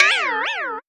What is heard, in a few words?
Dazed, Classic SFX, cartoon; musicradar; cartoon caper